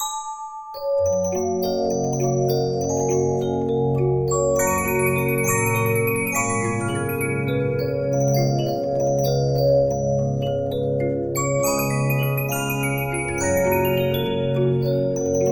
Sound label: Regina, 15inch disc musicbox